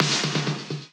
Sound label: Snare FX